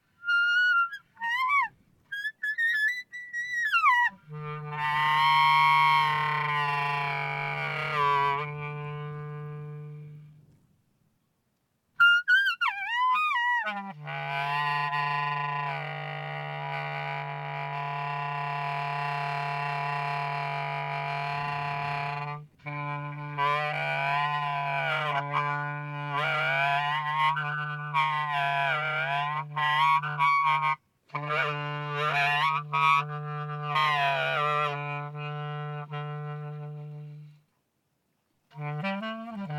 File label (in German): Wind Frei Rau Ober Ton Spiel